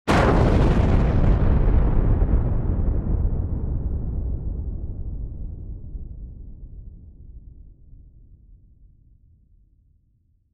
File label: thud